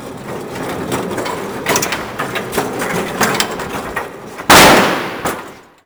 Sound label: Bleachers Metal Open; Doors